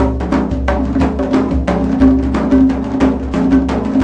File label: 119 mideast complex, DRUM LOOPS and BREAKS